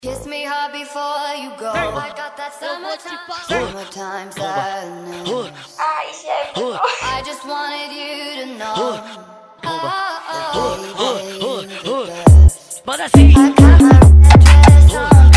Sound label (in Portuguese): edicao automotivo; eletronica souza